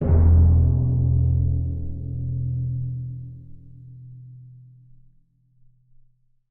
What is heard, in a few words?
Vamp Timpani